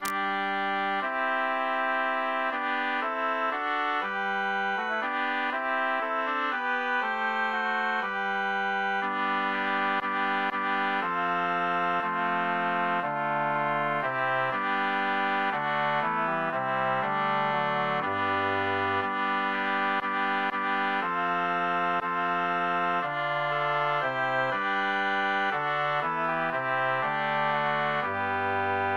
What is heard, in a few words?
transposed